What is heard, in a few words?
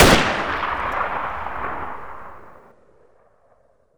m1 carbine shoot